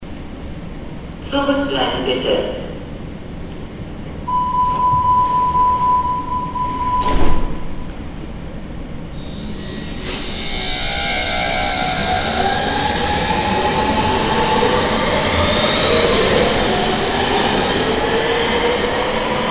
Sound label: subway departure